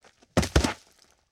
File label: Body Falls on a surface with leaves